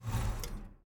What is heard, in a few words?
vehicle classic turnoff